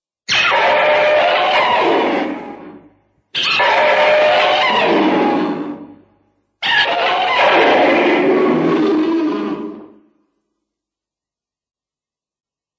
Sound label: tv radon koe